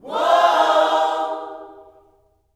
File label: WHOA OHS, Gospel; Spectrasonics; Vocal Planet Vol.1 Gospel